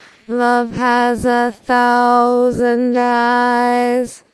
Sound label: singing